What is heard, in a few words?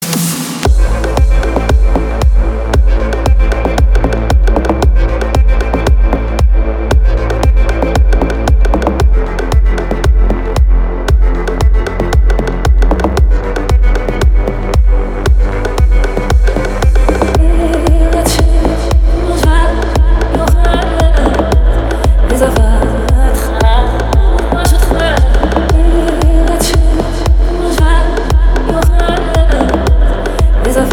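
Melodiya zvonka, ringtone melody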